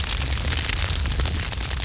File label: napalm